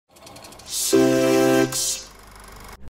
commercial intro sound; cex

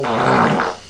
wet fart short

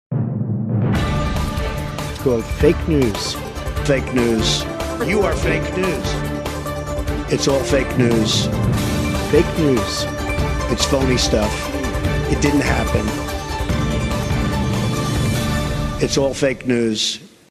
fake news song

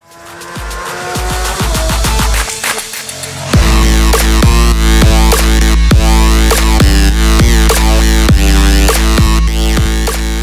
fast car music; bugatti veyron; need for speed 2017

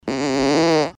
fart

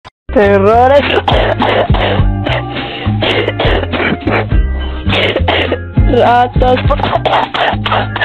coughing

